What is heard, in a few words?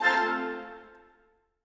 Pick And Click Rose; netent